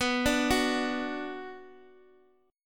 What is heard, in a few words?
B Minor B Guitar Open G